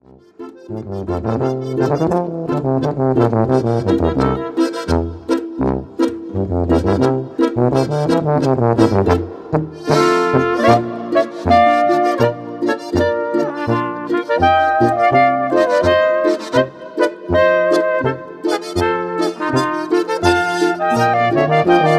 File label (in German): Druck nur zua Boarischer